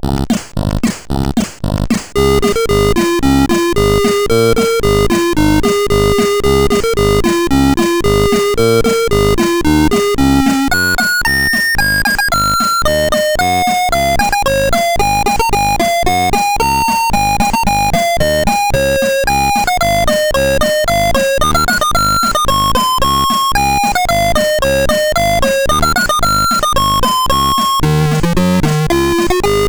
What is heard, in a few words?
Christmas Medley, CSG8580R5